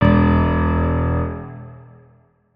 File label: notes, keyboard piano; Hideout Furniture